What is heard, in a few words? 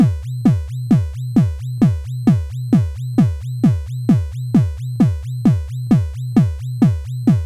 Groovy Beat Metal; free techno acid samples